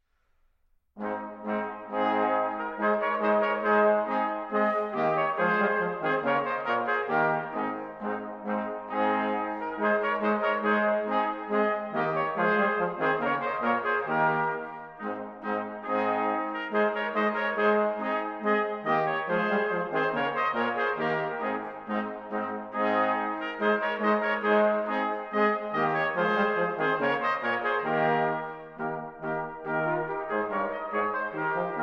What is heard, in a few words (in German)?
Marsch; J-C-Fischer